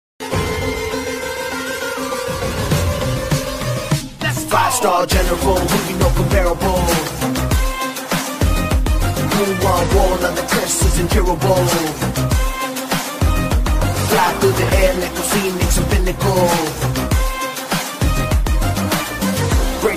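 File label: rap background music ringtone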